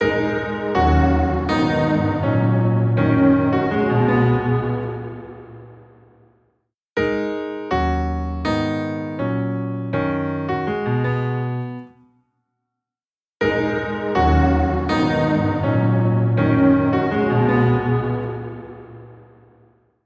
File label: Piano Ethereal Piano Recital Stereo; MicroPitch Immersive